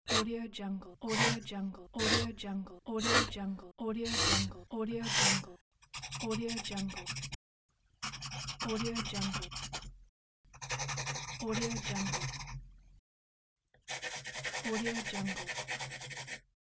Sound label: pencil